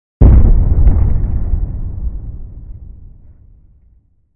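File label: Explo Thunderous Distant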